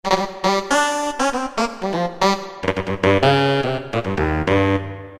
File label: Wild Blow, Roland PN-D50-01